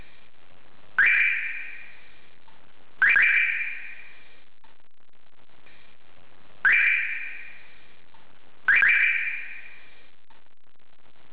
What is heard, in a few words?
siren; オプション高級サイレン; キーレスのロック・アンロックに連動